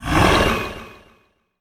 Roar Short

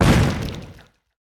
Plague Boom

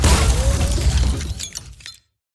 Wulfrum Bastion Break

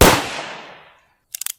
Colt Shot

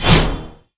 Slash; Catastrophe Resonance

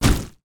Slime God Shot

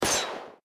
Splatshot Big Impact

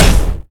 Hit Open

